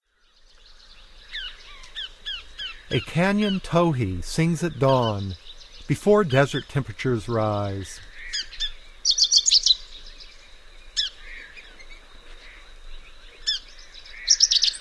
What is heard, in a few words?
Canyon Towhee, Bird Sounds; North America; Common Western Species; Know Your Bird Sounds